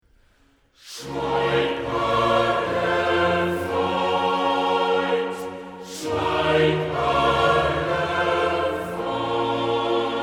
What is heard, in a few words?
Choral; Schweig Arger Feind